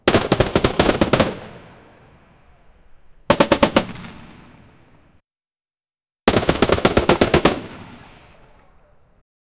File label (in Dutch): 16e eeuwse kanonnen die s, cannons